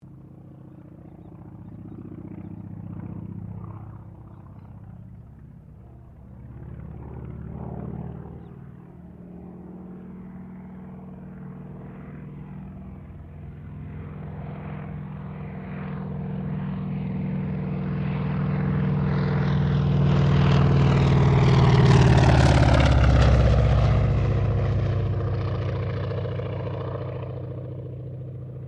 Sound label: AIRCRAFT CA-28 CERES PASS